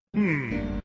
hmmm

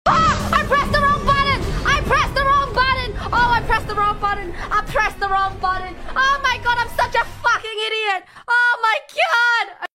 wrong button

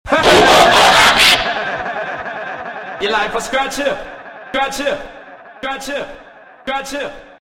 cat with transform laugh; super cat

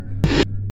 popup; slendytubbies 2

